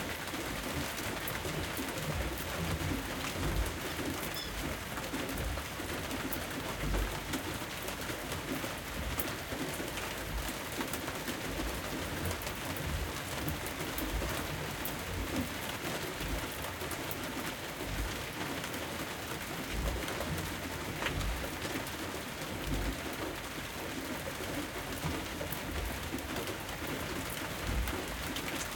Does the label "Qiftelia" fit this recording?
No